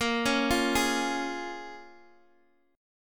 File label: A sharp Minor 7th, A sharp, down, Guitar, Open D